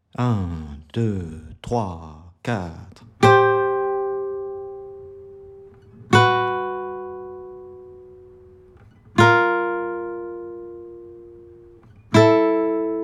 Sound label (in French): exercice b 080bpm